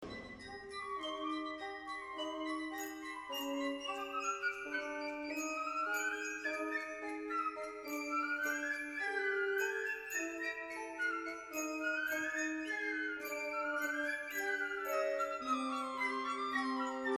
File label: circus ring